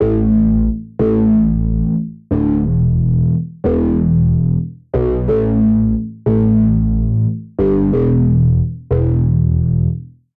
Thick 'n' Rez E Minor 91 bpm, Bassline; MIDI Clips; Tonal; Ableton; Factory Packs; Chop and Swing

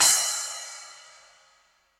Splash 10 Classic Muffle, Cymbal; Drum Hits; Standard Hits; Session Drums Studio

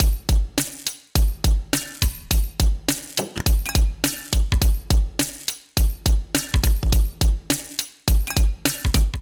Toy Hip Hop Kit 104 Bpm, drums; MIDI Clips; Ableton; Factory Packs; Vibrations; ModeAudio

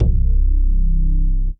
Upright Bass; Drive and Glow; Ableton; Factory Packs

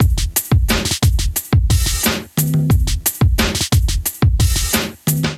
Kit 89 bpm, Drums; MIDI Clips; Zoned; Ableton; Chop and Swing

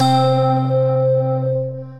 Copper Keys, Synth Keys; Wavetable; Ableton; Synth Essentials

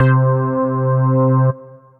Organ, synth keys; Organ Plaza; Ableton; Factory Packs; Singularities

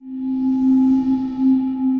Ambient & Evolving; Ableton; Glitch and Wash

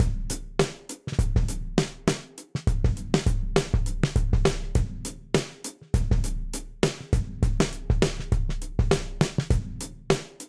Groove Classic Muffle 101bpm, Session Drums; Meteorite; Groove On 8M; Ableton; Factory Packs; Session Drums Studio

